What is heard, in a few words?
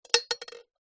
food egg drop into pan of water; zapsplat